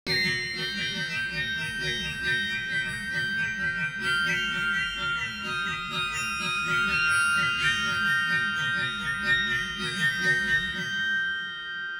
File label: ambient